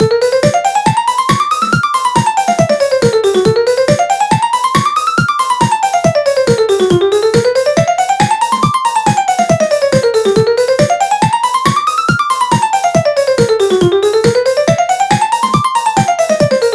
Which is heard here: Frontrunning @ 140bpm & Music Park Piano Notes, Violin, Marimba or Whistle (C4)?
Frontrunning @ 140bpm & Music Park Piano Notes